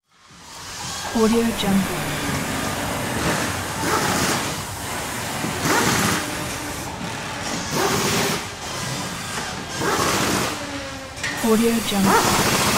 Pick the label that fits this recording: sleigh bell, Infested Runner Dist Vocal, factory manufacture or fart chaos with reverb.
factory manufacture